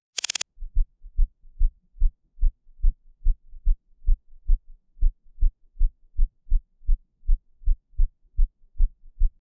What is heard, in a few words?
camera shutter soft